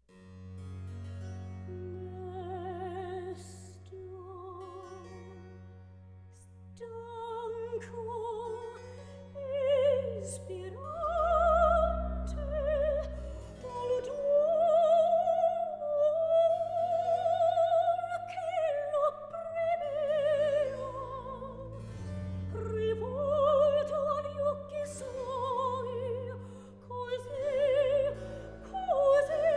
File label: baroque duet